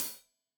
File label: Hat Tight, Hi-Hat, Cymbals; Deeply Sampled Drums